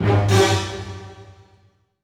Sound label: Orchestral Loops